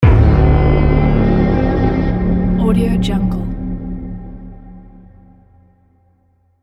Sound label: epic viking war impact braam